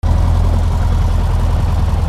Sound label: flight